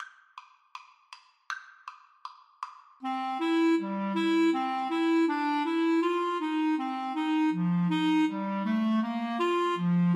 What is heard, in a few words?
Clarinet duet